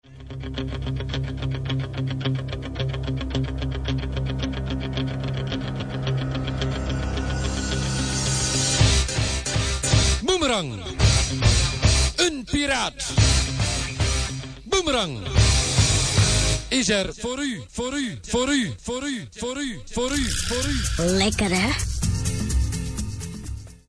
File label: Jingle; Audio Fragmenten; Arkan Radio 2